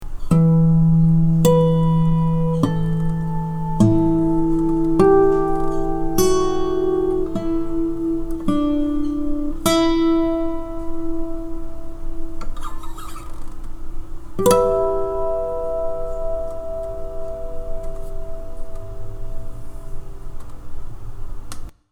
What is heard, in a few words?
harmonics